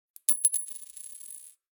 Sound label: Coin fall